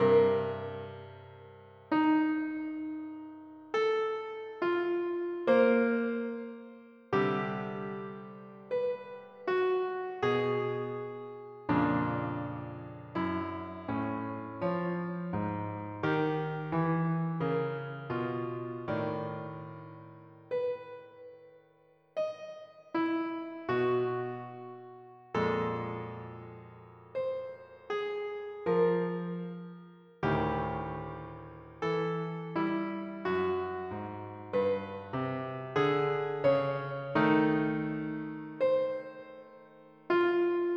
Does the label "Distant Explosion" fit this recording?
No